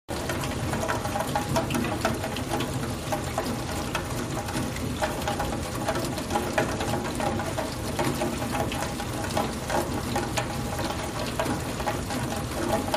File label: Rain Heavy Rain Water, raindrops, splatty